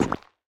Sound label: movement step; smite; odin